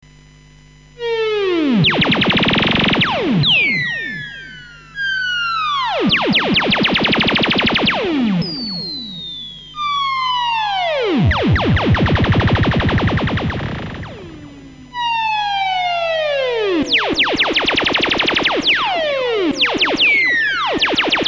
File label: laser